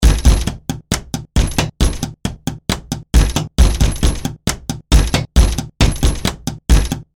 table tops

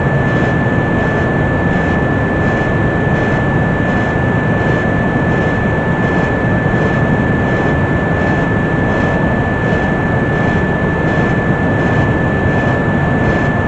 cinematic textures and drones

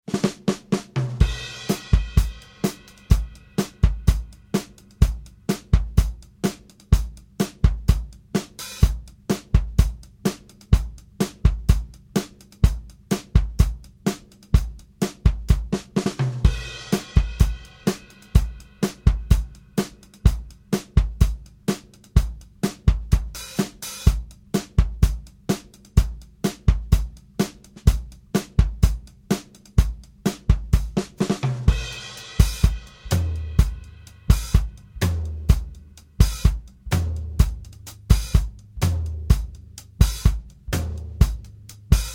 126 70s pop rock

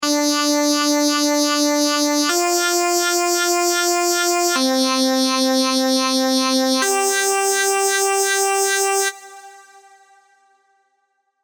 serum seqs arp; chiqui audio

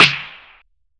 bat snare

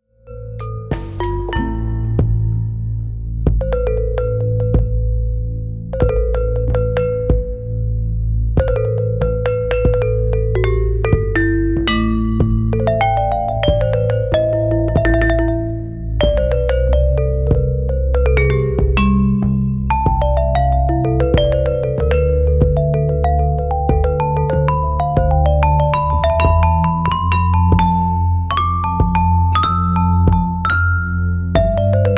bayou boogie marimba

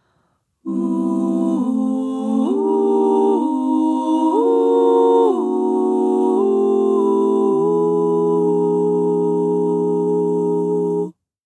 nightingale dry

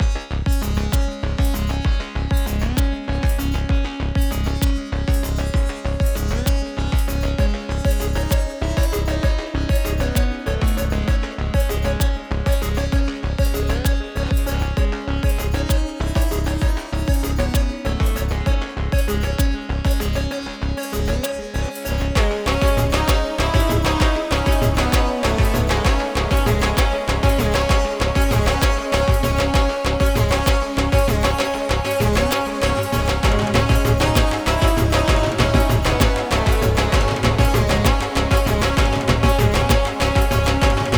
dhol mix

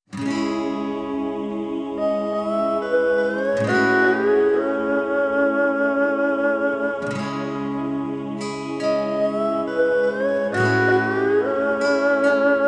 beautiful chinese pop music

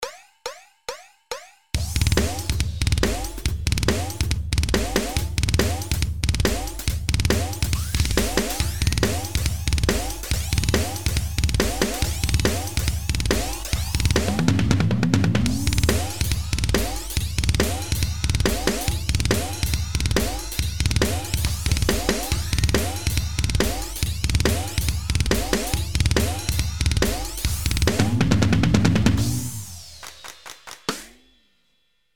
drums ufo; taldrum

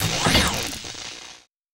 shock weapon pickup

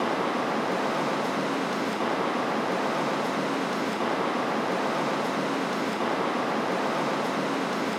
street ambience in loop

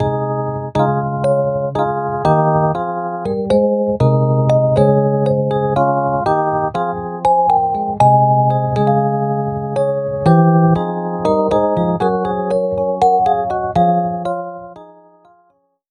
electric dyno at home; electricdyno mkv 2.0

